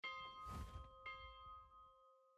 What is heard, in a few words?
ui nav forward; merchantnavy; everdawn